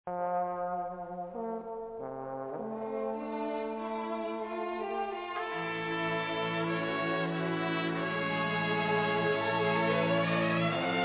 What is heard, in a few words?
Trombone